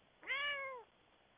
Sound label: cat meow